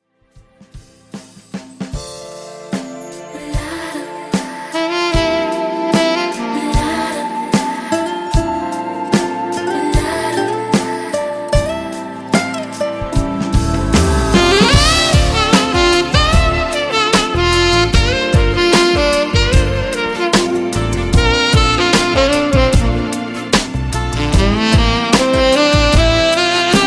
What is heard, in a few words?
Jazz ReDrum 150Bpm